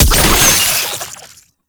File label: Last Shot; Weapons; Warframe; Tenno; Oraxia